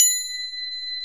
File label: Crotales, Orchestral Perc; Roland LCDP03 Orchestral Perc